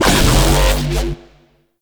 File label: Infested Shotgun Growl; Warframe; Weapons; Infested Mercy Shotgun